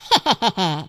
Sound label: Laugh, voices; Default pl